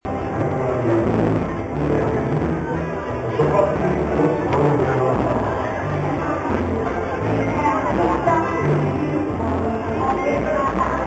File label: suffering french music on bastille day